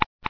geiger; hl2

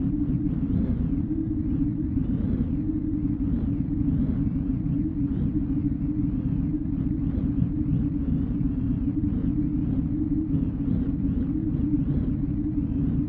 Interior Tones, Abstract; Digital Rain Lab

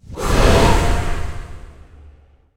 Magic Spells Cast Short Air Whistling; David Dumais Audio; Air Magic 1

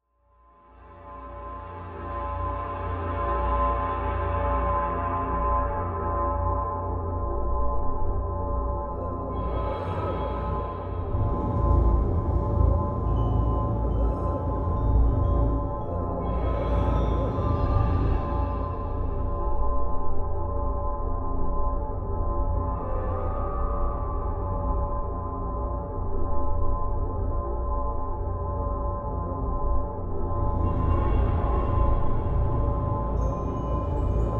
second planet; Leslie Reid; STRANGE WORLDS PART 1

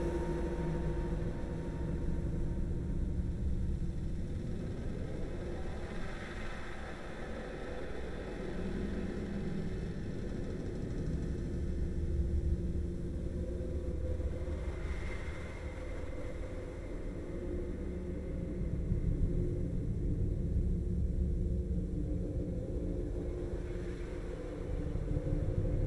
AMBIENCE THE HAUNTING LOOP; Shapeforms; Dystopia; Ambience & Drone

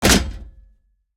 bodyfall Metal Grid Close Stereo Hard Impact; Red Libraries

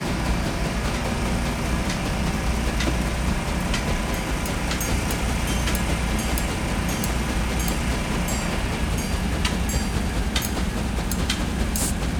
Construction Scene, Construction Sites; Larry Salzman

